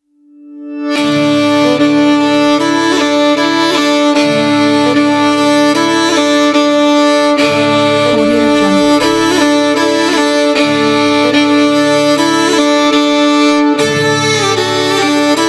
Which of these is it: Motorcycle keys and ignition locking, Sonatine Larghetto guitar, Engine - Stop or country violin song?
country violin song